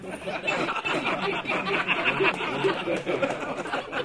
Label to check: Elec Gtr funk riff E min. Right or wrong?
Wrong